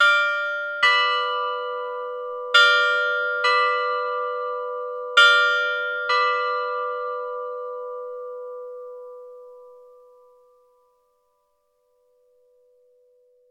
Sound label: bells